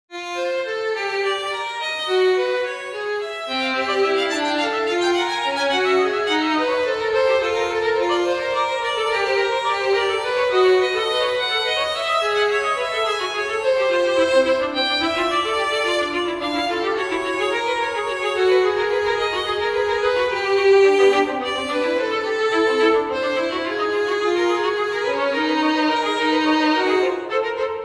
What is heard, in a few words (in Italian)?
Ricercare 2 violini